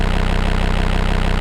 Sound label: glitch; Zenit